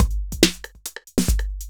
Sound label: duet, Drum loops, Electro